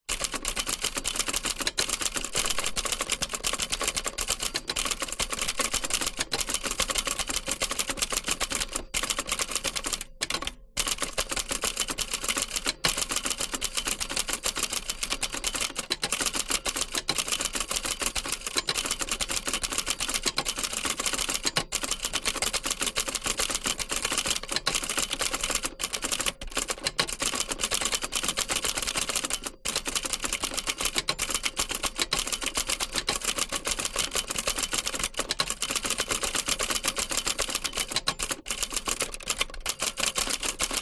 office typewriter typing fast rear close vintage 1960; lemair helvetia